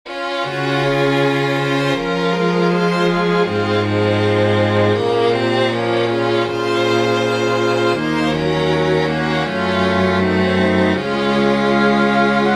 Orchestra

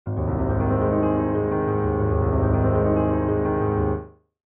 Texture excerpt

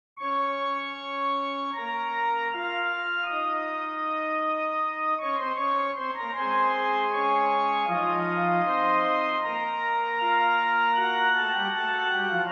Fugue Bb minor

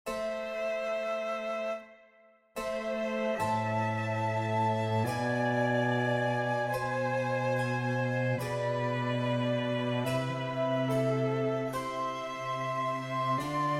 Trio sonate C Adagio

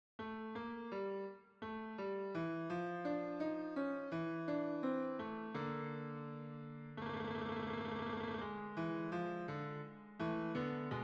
short fugue piano no pedal

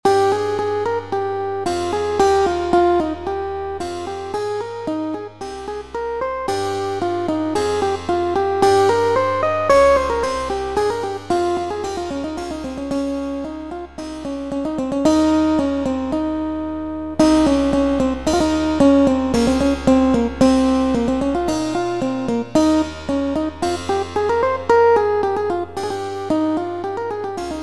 short sci fi suite

